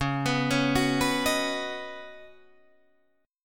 Db 7th Suspended 2nd Suspended 4th Db, down, Guitar Drop D; Db7sus2sus4; arpeggiated